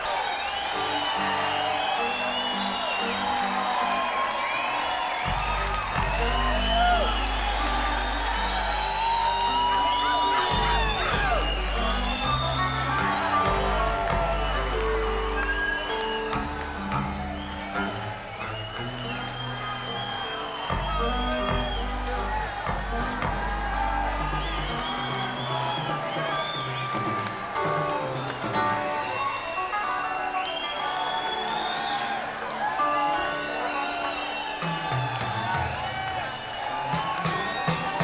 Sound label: Crowd